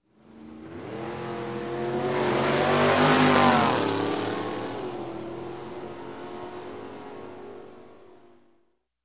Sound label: Stock Car Drag Race; Sound Ideas; Series 2000